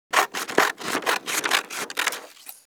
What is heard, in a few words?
SCISSOR